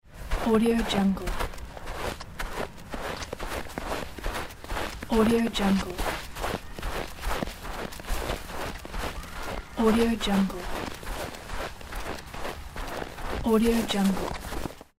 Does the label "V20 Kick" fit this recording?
No